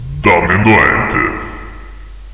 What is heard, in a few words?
zombie; cstrike; ambience